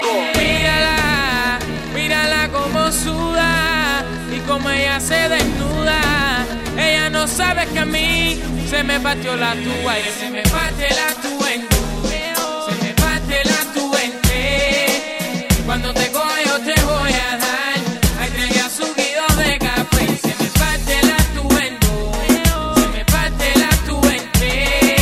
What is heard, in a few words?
tuba